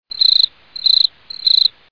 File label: cicada